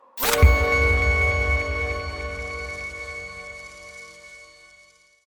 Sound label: game start noise; HLD